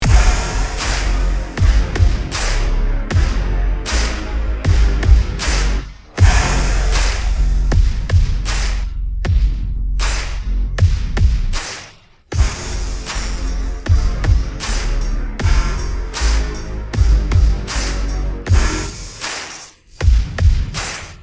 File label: Interval BGM Mix; Extra Ordinary Man INTERVAL BGM Mix